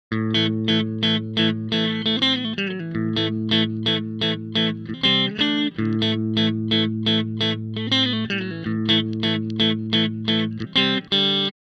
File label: clean pickup